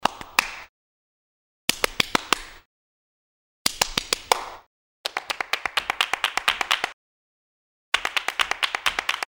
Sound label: single claps mix